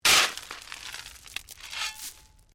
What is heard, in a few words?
Digging Shovel Stones; Construction; Various digging tools and processes